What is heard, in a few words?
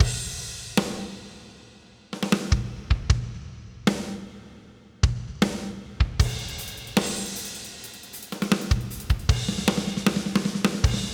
LOUD ROCK ACOUSTIC DRUMS COMPRESSOR BAD